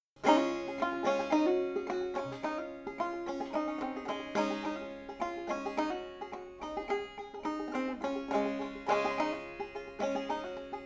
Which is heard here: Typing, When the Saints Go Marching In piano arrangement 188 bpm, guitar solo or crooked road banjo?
crooked road banjo